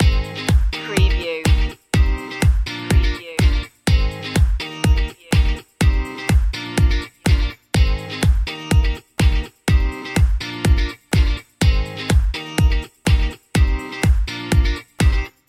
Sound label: beach dance loop